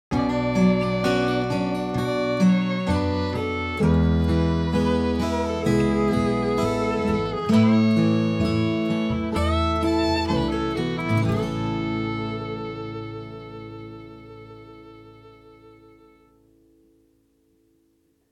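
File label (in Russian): дневное солнце ленивая жизнь расслабленная красивая короткая музыка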